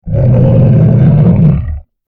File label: Monster Growl; horror; horror sfx vol 1